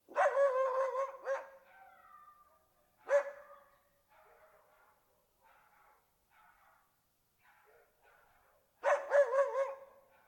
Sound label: Small ambient dogs; STALZONE